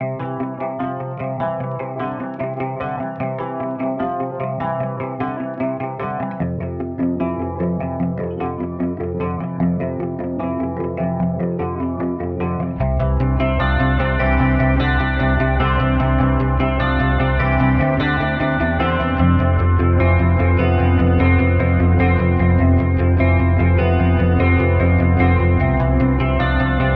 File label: 150 BPM C Maj, Guitar; Punks; Cymatics; Miami Guitar Pack - Pt 2